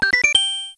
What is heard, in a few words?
Message Block SFX